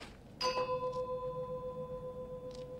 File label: ping